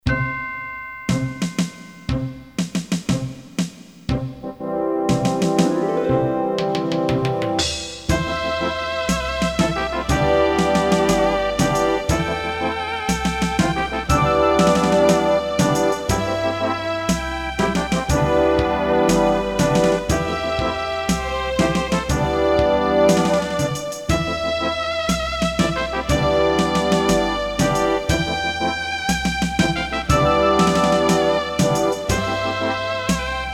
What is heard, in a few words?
Casio GZ-50M; Runescape